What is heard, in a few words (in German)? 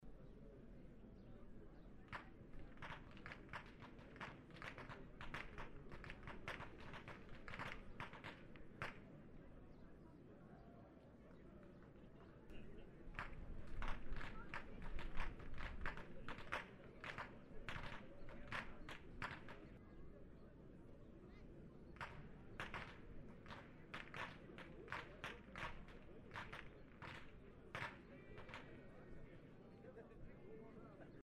schnalzen, jugend; waging